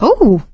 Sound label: positive noise, survivor voice; Left 4 Dead 2; producer